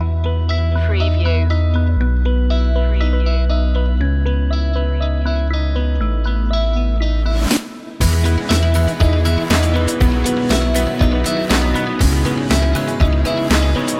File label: uplifting and motivational